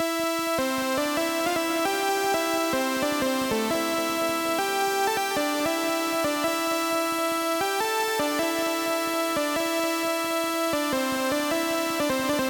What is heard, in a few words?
Melody loop